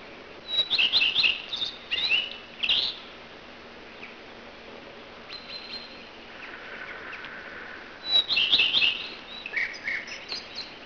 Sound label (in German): gartenrotschwaenzchen; park; voegel